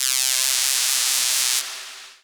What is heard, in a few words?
lead, synths